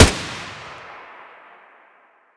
aug muzzle mono distant, weapons; STALKER; IWP

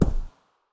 p90 silencer mono far, weapons

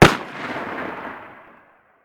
deagle muzzle mono far

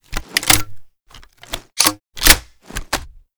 b725 reload, weapons

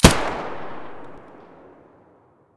muzzle sa80 stereo

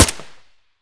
ak74u silencer mono; STALKER; IWP

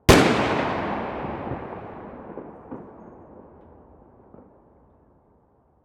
sks muzzle mono far

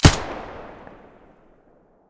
aps muzzle stereo; STALKER; IWP

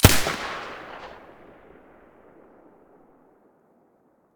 silencer mp9 stereo, weapons

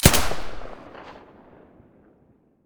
silencer ump45 stereo, weapons

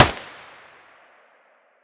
mp5 muzzle mono distant, weapons

